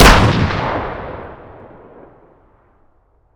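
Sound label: weapon dmr fire player atmo ext; gmod; viper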